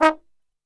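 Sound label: D#2 trombone shot, Brass; Best Service; Brass Super Section; TRMB SHOT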